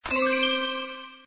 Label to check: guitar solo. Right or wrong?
Wrong